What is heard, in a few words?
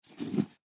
Camera Pick Up Wood Digital; Technology; Cameras; Electronics; Blastwave